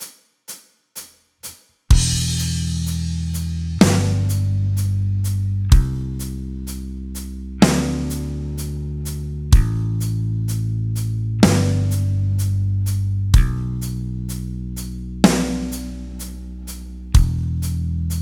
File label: Backing Track for Guitar